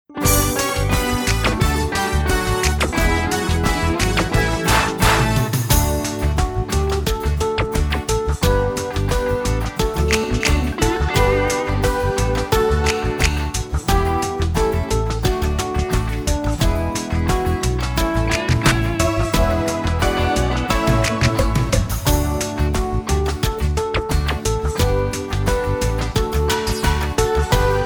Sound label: Karaoke